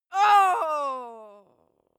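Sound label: female death, screams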